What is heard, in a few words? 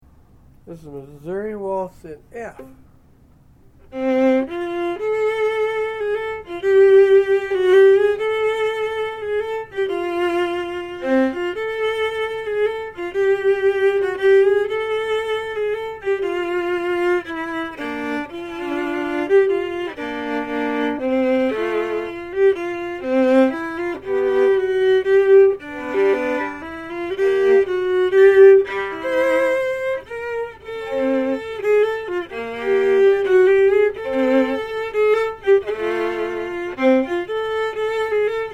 Waltz F, Fiddle; Fiddle chords